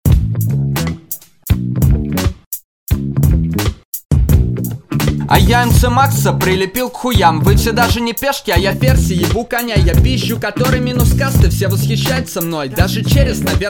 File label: instrumental version